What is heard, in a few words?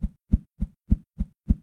Wave A Hand; LOOPERS